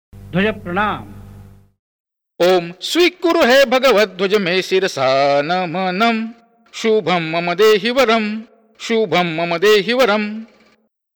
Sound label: Dhwajpranam Mantra